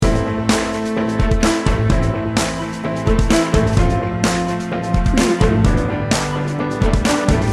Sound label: adventure junkie loop